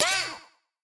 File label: spray